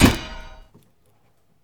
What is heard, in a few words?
Hit Generic Light Metal